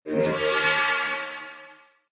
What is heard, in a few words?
droid sound